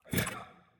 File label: zombie vox; smite; ahpuch skin10